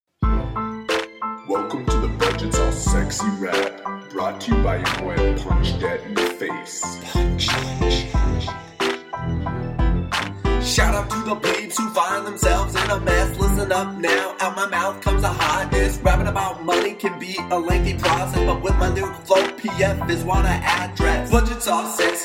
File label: rap